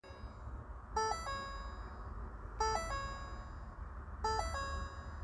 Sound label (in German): Signalton Transit; unterschiedliche warntoene